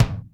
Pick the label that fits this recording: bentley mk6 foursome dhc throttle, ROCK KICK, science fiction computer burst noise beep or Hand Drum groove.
ROCK KICK